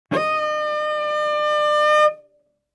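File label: Cello arco fortissimo sul D D4; MIS Pitches - 2014; Strings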